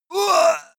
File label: male hit